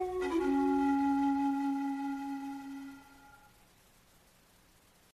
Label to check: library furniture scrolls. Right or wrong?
Wrong